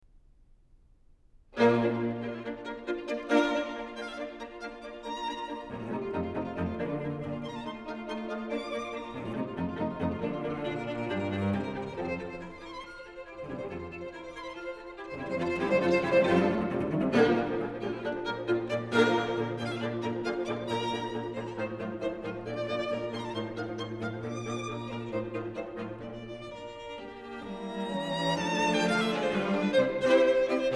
String Quartet No. 6 in B-Flat Major, Allegro con brio; Ludwig van Beethoven; Beethoven - String Quartets No. 1-6, Op. 18